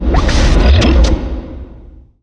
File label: vacuum; dark seer